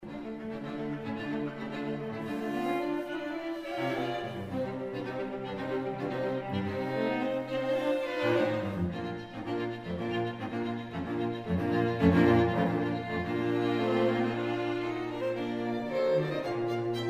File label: String Quartet in F Allegro